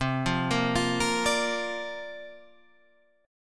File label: C 9th Suspended 4th C